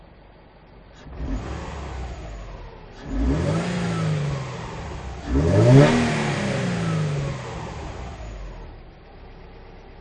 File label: Toyota FT 86 II Concept Engine Sound